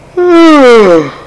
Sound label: yawning; People; Sound Library 2000; Wayzata Technology